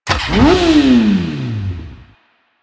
Mount, Motorcycle; Motorcycle Ninety Nine

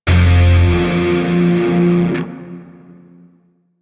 Grineer Shawzin Maj Chord J; Music; DE; Warframe

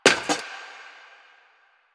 cafe chair short

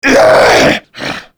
Zombie Attack; Warframe; Cicada Zombie; Vocalizations

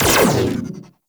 Burst Laser Pistol Prime Fire; DE; Warframe; Weapons; Sentinel

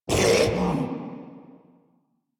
Pain; Grineer Ghoul Devourer

